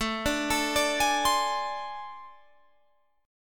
A Minor Major 7th Double Flat 5th A, Guitar, Open G